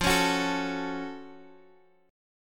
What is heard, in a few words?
Gb Minor Major 7th F